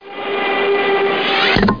falling; How Many Bugs in a Box

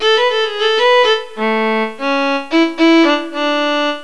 VIOLIN; Pipo-Aprende musica con Pipo

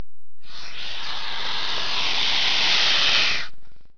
SNAKE

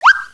Slip; Princess and the Pea; SoftKey

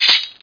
sticky

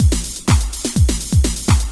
DRUMLOOP HOUSE 125; eJay

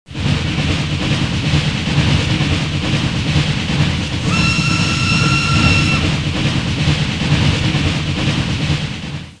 Shugga Shugga; backpack; cities

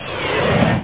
LAUNCH; t2029

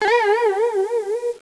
sprung

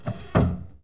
CLOSE; THEREALM

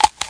splash

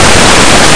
book

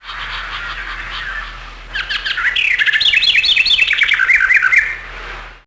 bird; PC Gamer IT CD 38; thief

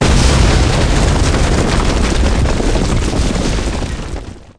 asteroid destroy

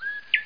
CHIRP

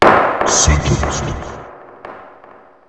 gavel; Games95

